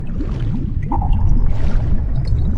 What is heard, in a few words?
underwater sounds